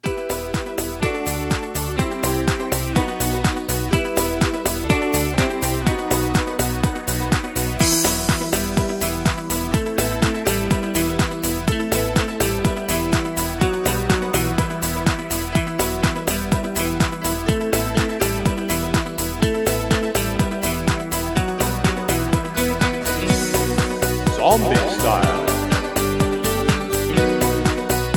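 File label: Instrumental with background vocals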